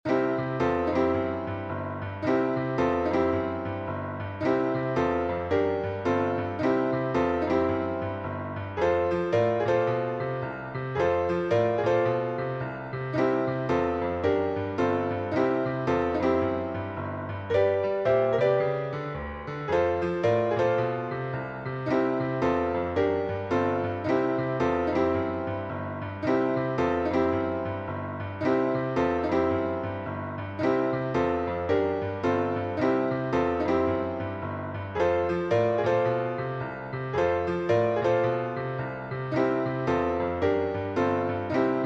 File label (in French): boogie; blues piano; Accompagnement Main Gauche